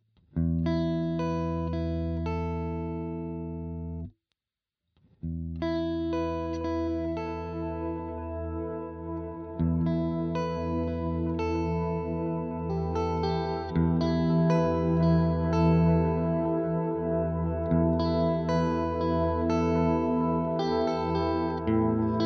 Riff

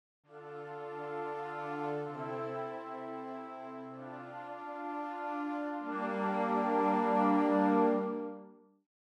Traditional Flutes Portato; VENTO

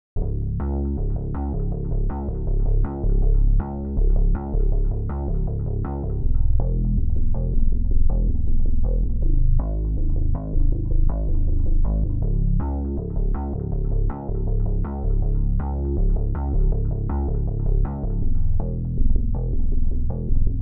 Bass Guitar FX Normal; TB Lowtone; no Lim

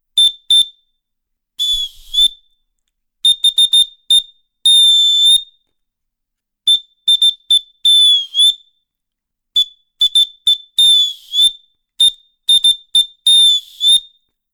Tin Whistle; FX Orpheus

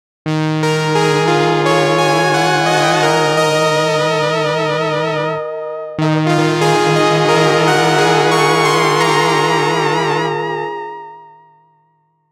Oberheim OB-E Bell in the wind; G-Force Software

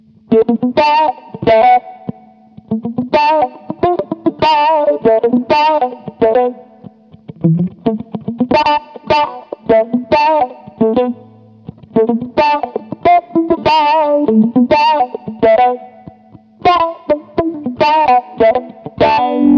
Comp off Single Note Line; Auto Quack

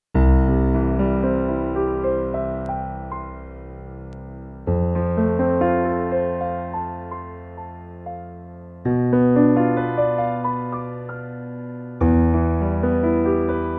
UR RT2 Piano

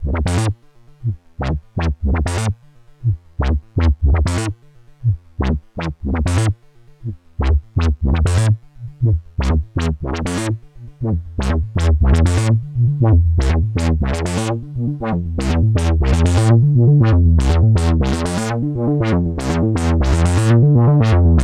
Moog Moogerfooger Fooger Synth Freq Box; 3 x

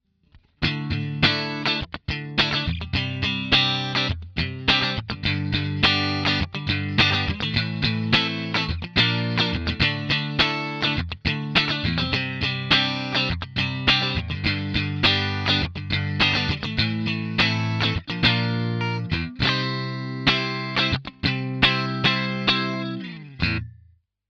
Clean Strat Class AB MSDI direct out

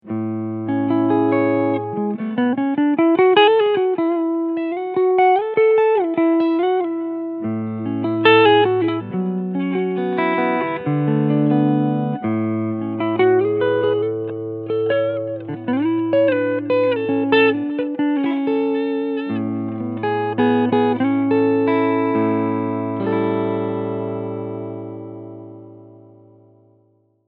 Cleansound Front PU; Ibanez AM93ME NT

